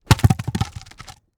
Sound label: log drop; prosoundfx